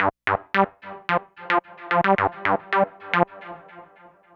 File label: Synthetic Riff; Dr. Fink's Funk Factory